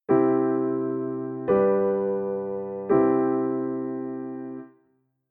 C G C shape

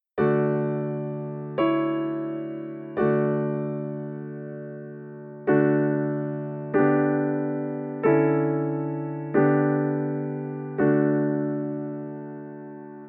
tonic pedal D; Guided practice

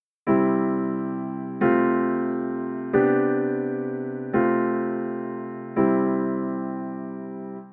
Tonic pedal V I

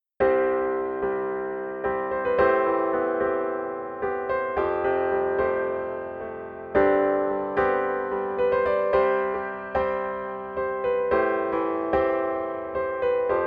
solo

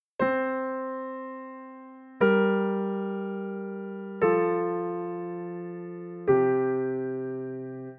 soprano and bass

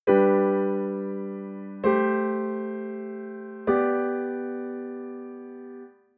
Key of G I ii I6

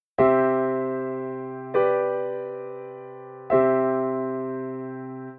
Tonic pedal V I

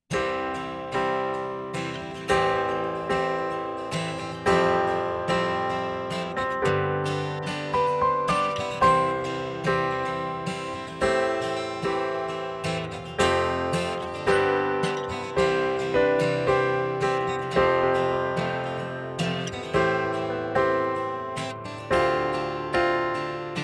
with guitar

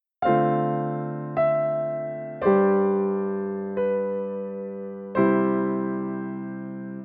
V suspension

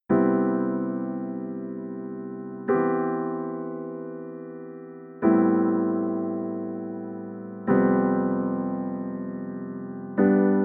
added scale degree 2

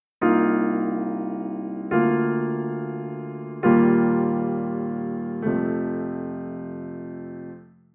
ii V Bb major

